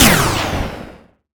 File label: Laser